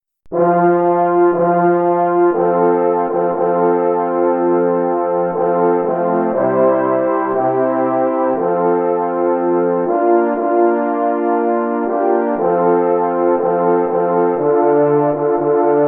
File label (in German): F-Dur French Horn